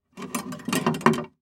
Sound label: Foley Wire Rattle Metal